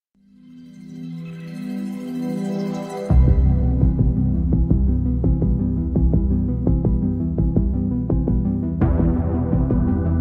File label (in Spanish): trompeta militar